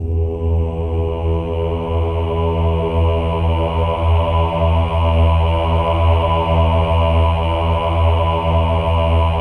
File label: OH-AH E2 R, CHOIR; Spectrasonics; Symphony of Voices